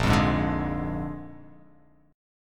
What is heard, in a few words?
A sharp 7th, chord; x,1,3,1,2,3,x,x